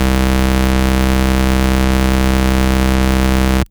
Ultimate Bass Root C; Vengeance Samples

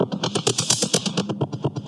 House loops 128BPM; Vengeance Samples

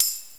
Tamb; Tambourine; Cymbals; Vengeance Samples

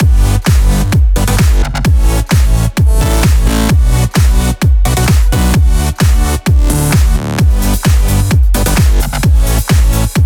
128BPM Face to Face Mix; Vengeance Samples; Face to Face Kit

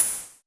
Cymbals Open HH; Vengeance Samples

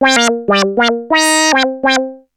ARP Odyssey, Arp A Line, synth samples

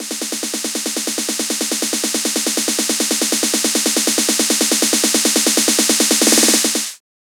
140BPM SNARE 4 Bars; Epsilon; Vengeance Samples; Snares FX Pairs

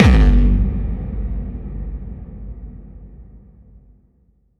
Hits & Explode

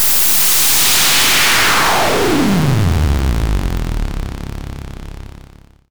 FX Downlifter

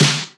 Snare; Vengeance Samples

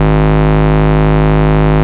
Ultimate Bass G# Root G#; Vengeance Samples

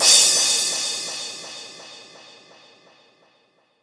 Crash; Vengeance Samples; VEE Cymbals

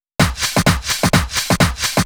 Fill 128 BPM; Vengeance Samples; VEH1 Loops

